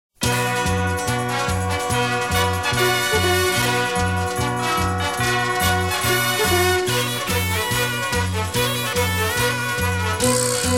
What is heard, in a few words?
Instrumental TV Version; Iron King OST